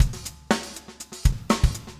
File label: funk 120 bpm, loops